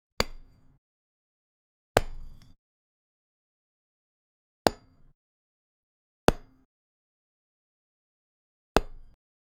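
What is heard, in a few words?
cartoon dart hitting target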